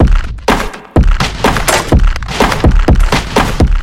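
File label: organic percussion loop 125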